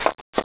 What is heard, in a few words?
turn